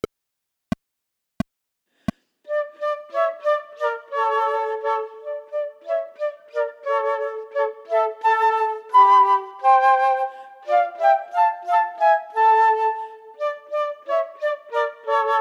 Chamber Music for 3 Flutes minus 1st part; Vecchi; Let Every Heart Be Merry

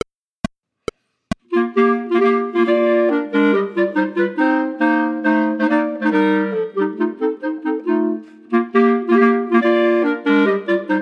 Equale Ensemble minus 2nd part; Mendelssohn

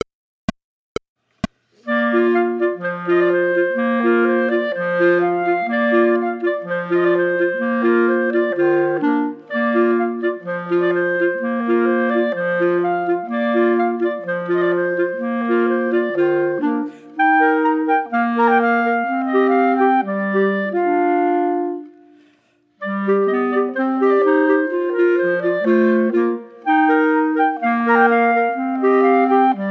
Bohemian Polka minus 2nd part; Ensemble Classics Book 1

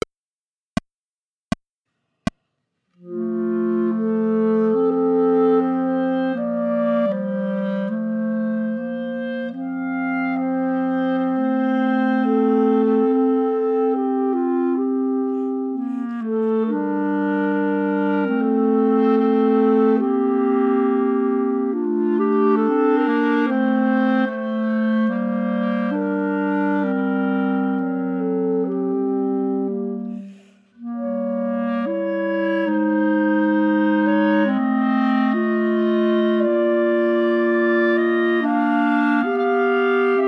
Prayer Ensemble minus 2nd part; Humperdinck

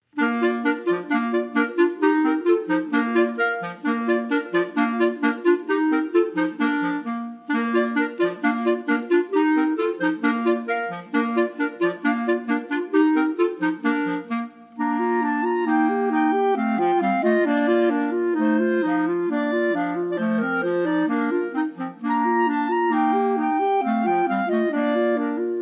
Ecossaise, Chamber Music for 3 Clarinets; Hummel

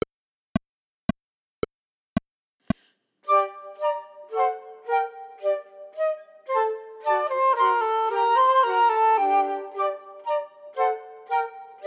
Minuet minus 2nd part; Scarlatti

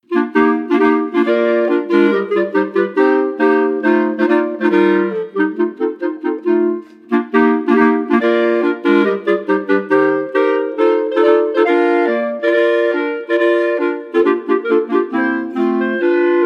Equale Ensemble complete version; Mendelssohn

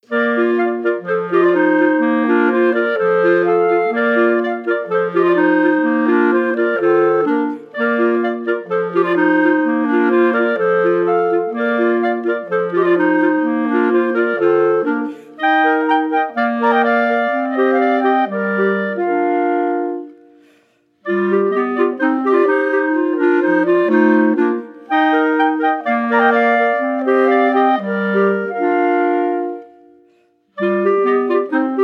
Bohemian Polka, complete version, Clarinets; Ensemble Classics Book 1; caboodles of clarinets quartets vol 3

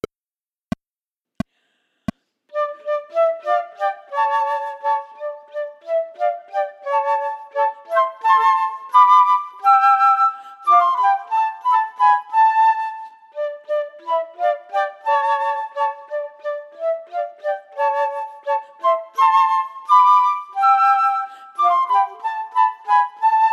Chamber Music for 3 Flutes minus 3rd part; Vecchi; Let Every Heart Be Merry

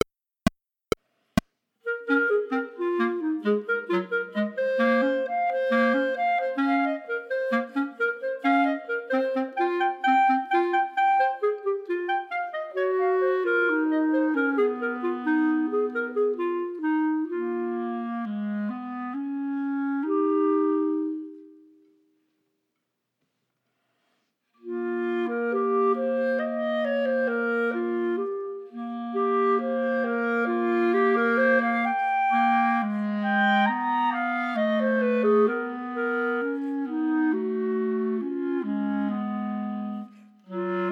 3 Pieces for 3 Bb Clarinets minus 2nd part

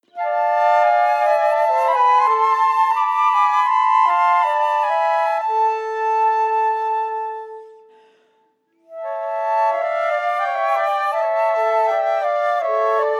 chorale chamber music for 3 flutes; Bach

